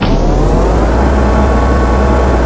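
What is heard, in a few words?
wind machine loop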